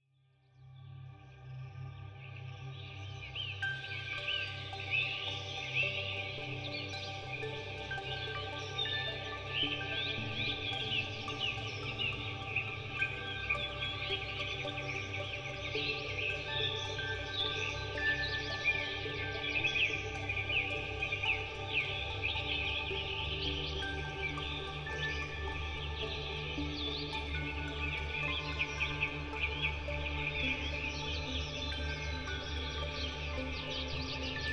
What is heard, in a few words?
Relaxing Music Therapy Rain